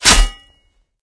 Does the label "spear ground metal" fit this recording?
Yes